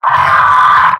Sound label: pain severe; Pyro; mvm